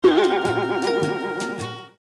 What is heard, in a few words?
boioioioing